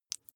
flesh wet greasy squish single

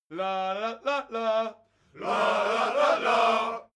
crowd musical bawling

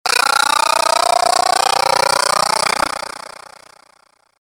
string noise

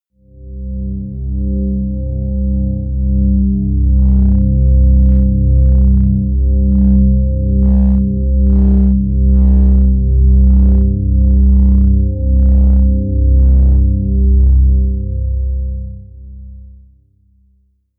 promo pad

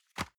envelope drop medium slide

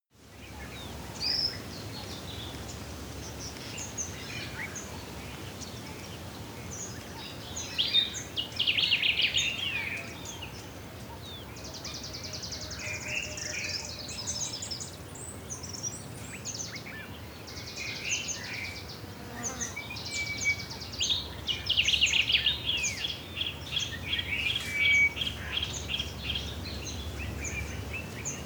african bush birds windy

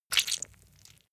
leather impact mud soft squeeze

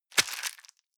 plastic bag small grab hard

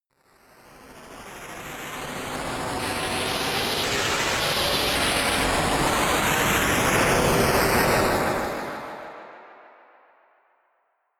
wind wave

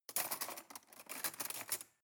cutlery cake fork drawer handle

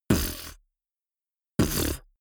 beatbox bass drum wobble

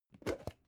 plastic bowl open

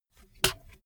bmx bike tire foot scuff short

